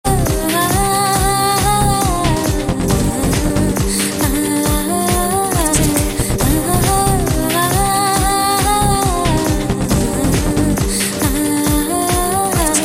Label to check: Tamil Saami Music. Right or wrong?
Right